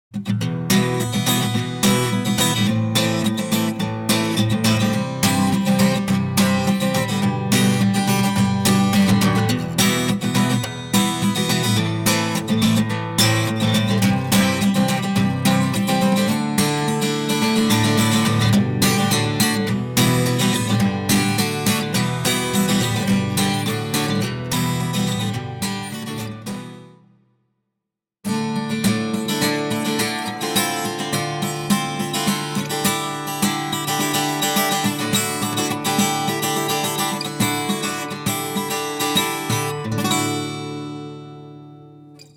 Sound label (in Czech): kytara; k ohni